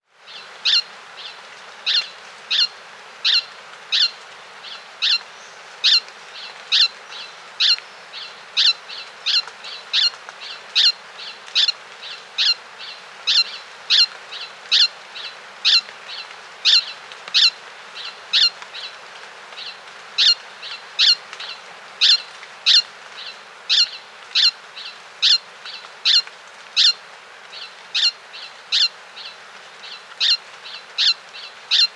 Song at lek, Birds of the Costa Rican Cloud Forest; Natures Songs